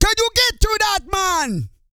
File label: REGGAE, Vocal Planet, World Voices; ILIO; Vocal Planet VOL-5